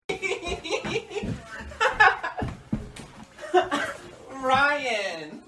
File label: hehehe